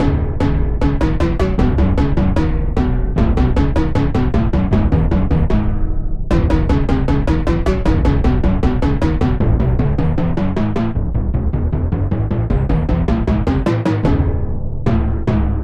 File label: Drum; Rhyme of Duotime BT3; The Bard's Tale Remastered Trilogy